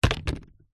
leaf dry fallen thick palm tropical light drop onto concrete; zapsplat